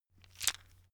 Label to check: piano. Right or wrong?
Wrong